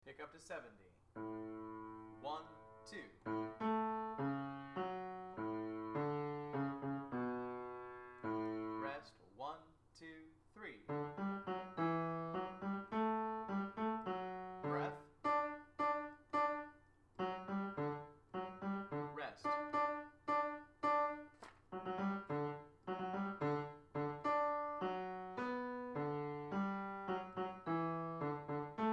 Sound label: Hall Bass, hallelujah chorus